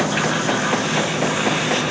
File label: hoover; Crazy Machines; Играет вся семья